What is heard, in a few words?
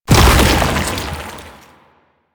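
Rolling Rot Projectile Explosion; Enemies; Abilities; Warframe; Proto Infested; Babau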